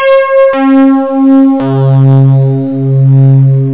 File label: beep vibes